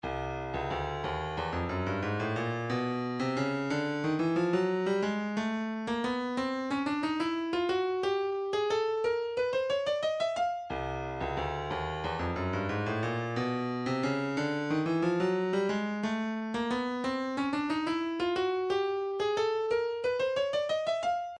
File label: 129 dumbek doumbek, midi